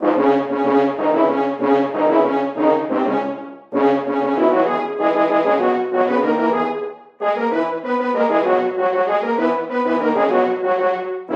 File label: Horn; The Bard's Tale Remastered Trilogy